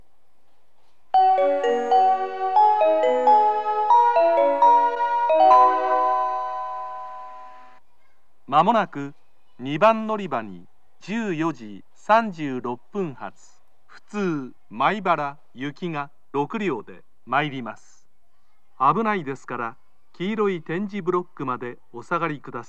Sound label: local maibara, kyoto, station